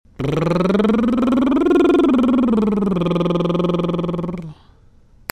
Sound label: lip roll scale